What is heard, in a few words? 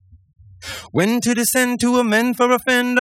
informed estim voice; WASPAA11